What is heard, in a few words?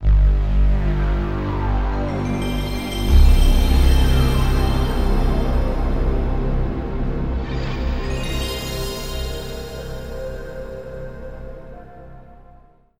ps1 startup sound; sony playstation